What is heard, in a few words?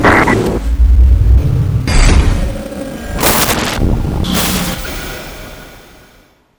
TV Explode; Preston Hotel